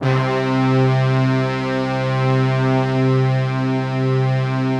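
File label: brass tutti, Slow Brass Tutti; Optical Media International - Sonic Images Library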